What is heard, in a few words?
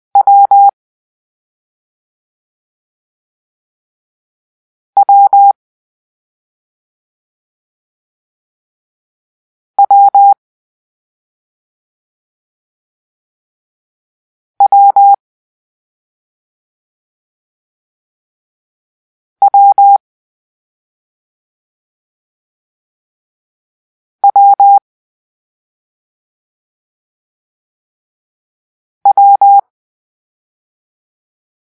Whiskey (W), morse code